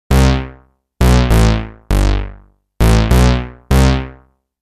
Plasma-Bass piece

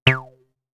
Reso, synthlead

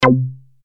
Strings hit

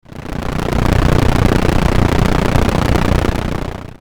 Old machine

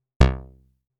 Short, synthlead

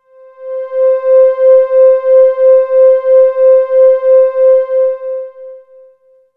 String Pad C

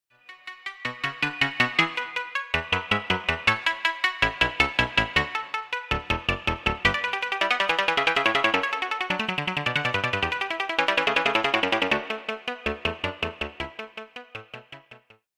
synthlead; Bach Sinfonia 15

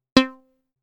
Short, synthlead

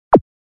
E-Tom, e-drums

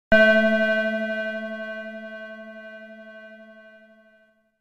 Grandfather's Clock

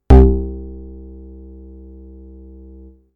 Basic Pulse, synthlead

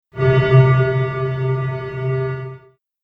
Bottle

